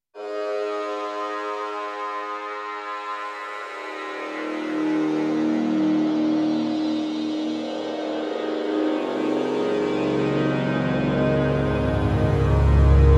Nord G2 Dream Sequence

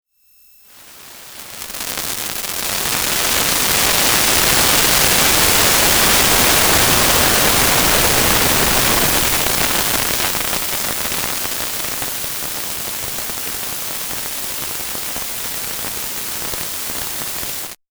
Metasonix D 2000 Gated Noise Output

